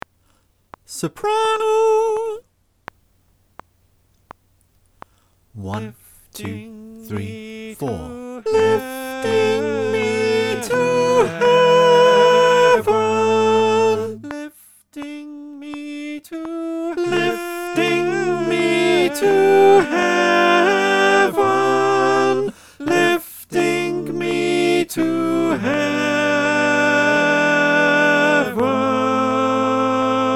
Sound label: sop- clicktrack